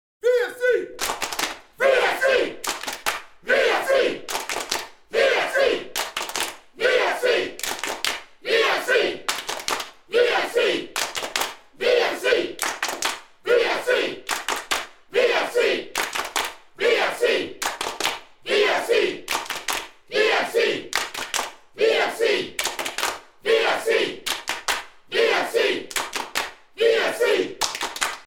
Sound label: CHANT